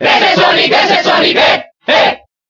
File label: Cheer Spanish; Sonic; SSBB